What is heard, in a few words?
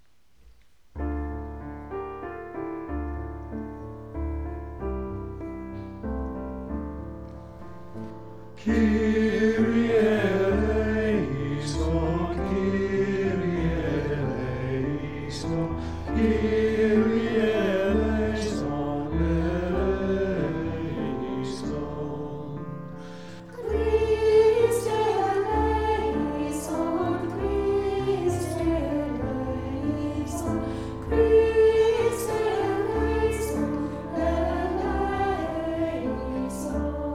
kyrie choir and piano; Heavenfield mass